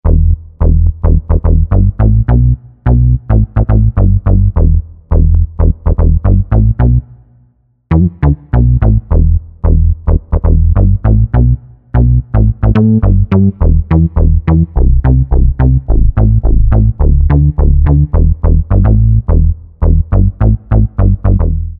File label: Moog, E-mu Proteus VX